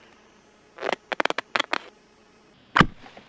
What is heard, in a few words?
Arrow